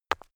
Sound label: stone midsize drop forest short; natur-tiere; steine